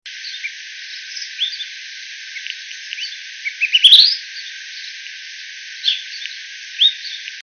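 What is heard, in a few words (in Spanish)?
suiriri, cantos, aves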